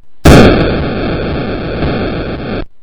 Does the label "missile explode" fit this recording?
Yes